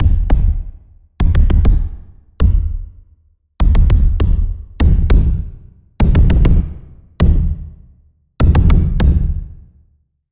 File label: Impact; Routed Sound; Sylenth1 Expansion - Quantum Enforcer Vol.1